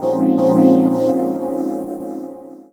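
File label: Pad Synth; Equipped Music; Loungin House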